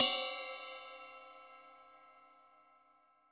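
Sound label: RIDE, Drum Machines; EdgeSounds; Drum Mashines VOL-1; X-DRUMS KIT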